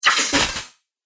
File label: Broken Droid Bits, Robot; Robot Sound Effects